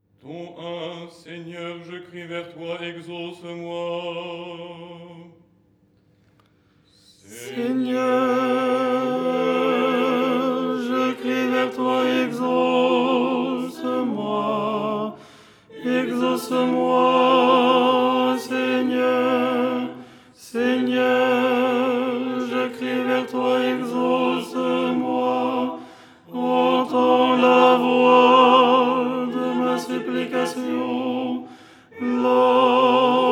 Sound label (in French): tenor